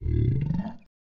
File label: zombie rising